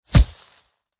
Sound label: printer cashout; nyxteam printer